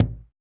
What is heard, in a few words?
UGANDA DRM, UGANDAN; Spectrasonics; Heart of Africa 1